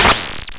Snare Gun; Cwejman S1; DevSnd Cwejman S1 - JK

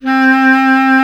B. Clarinet

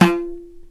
INDIAN, DrumBase; Crypton - DrumBase5000

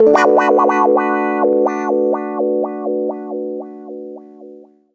120 WAH PAD, Electric & Acoustic Guitar Loops

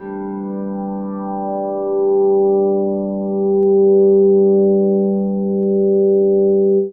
DRONE, SYNTH, Trance; AMG; Global Trance Mission VOL-2

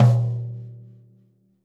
floor tom - snares on; JazzFunkKit

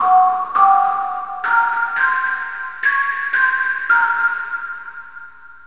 Riff, Synth Sequences

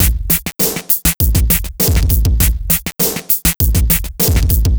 Noise Beat; Drums & Drones

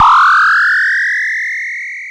BOSS PERC SNTH, DRUMS PERC

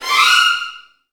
VN FX, Virtuoso Strings; ILIO; Kirk Hunter

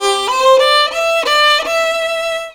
VIOLON A, Indian Traditions; USB Soundscan vol.45; AKAI

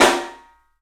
PIC SNR, PICOLO ROOM; 3D Sound

